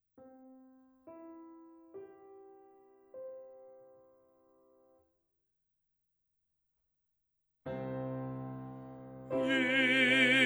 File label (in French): basse; jesu christe